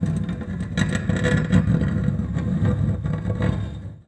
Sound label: String Scrape, Piano; Piano Miscellaneous